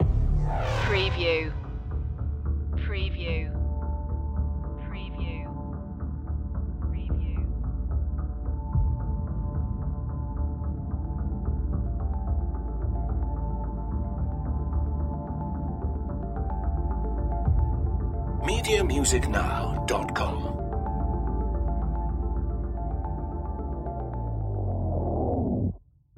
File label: dystopian machine loop